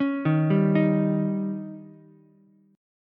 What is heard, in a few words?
C sharp Suspended 2nd Flat 5th C sharp Ukulele; arpeggiated; JGuitar